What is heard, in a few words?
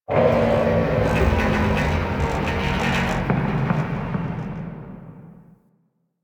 Ship Energy Rumble; Ambience; Warframe; Corpus Ship Remaster